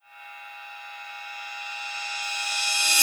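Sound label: Riser Crash Reverse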